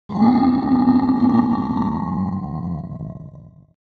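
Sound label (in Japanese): 叫び声（ウワー）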